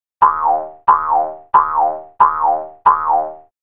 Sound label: Boings Bouncing Boing, Bouncing Twang; boings / twangs / snaps